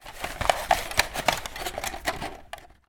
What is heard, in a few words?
household vhs video cassette handling